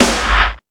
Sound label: Dance, Drum Machines